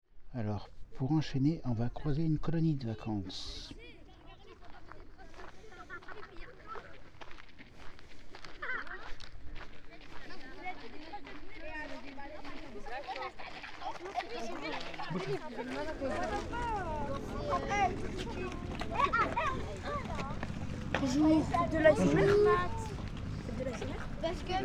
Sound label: holiday camp; lesconil2025